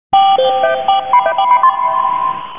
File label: Sony Aibo ERS 210 Card Dog Software Boot Music